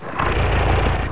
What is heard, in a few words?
barrack